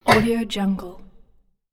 door

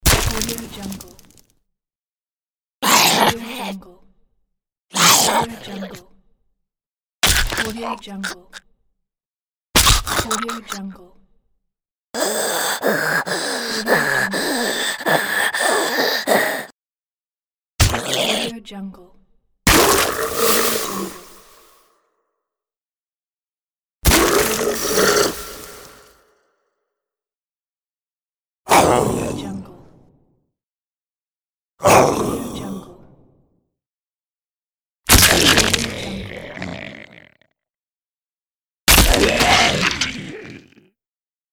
zombies male and female pack; abloom audio

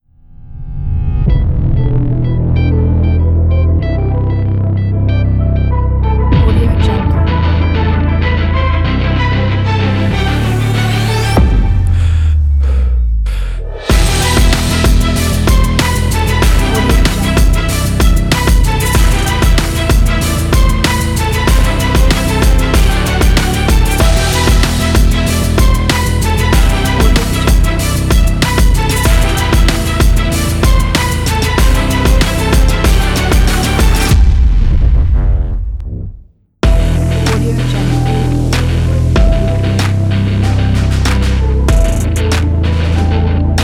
epic rock

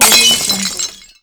window break

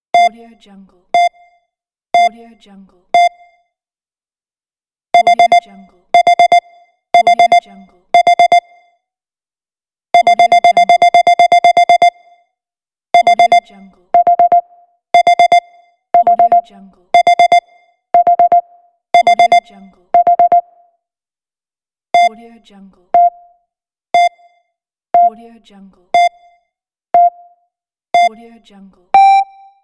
beep pack

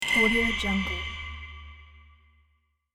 particles impact; voltatunes

